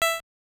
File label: ineffective ping; metroid